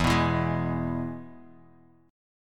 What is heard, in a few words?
E Major; strummed; chord; x,x,2,4,4,3,x,x